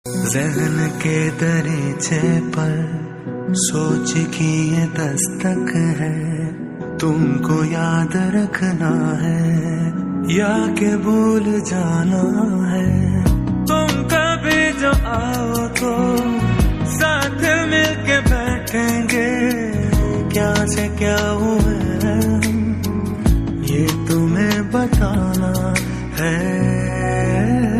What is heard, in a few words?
Ost pakistani ringtone; Sabaat